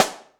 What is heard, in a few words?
SN, drum machines